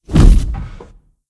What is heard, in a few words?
Foot Step; blue archive